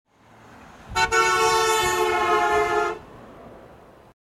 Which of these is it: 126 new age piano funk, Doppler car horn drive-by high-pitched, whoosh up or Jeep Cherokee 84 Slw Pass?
Doppler car horn drive-by high-pitched